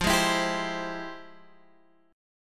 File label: F Minor Major 9th, F; FmM9 strummed; x,x,x,10,8,11,9,9